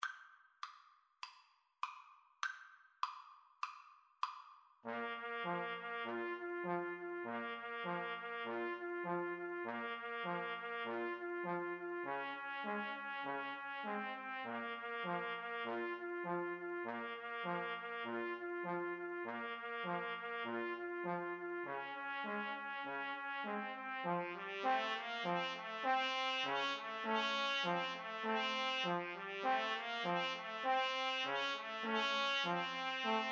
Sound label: 2 Trumpets Trombone; grieg mountain king